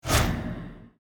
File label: chime